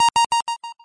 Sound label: bonus; carnival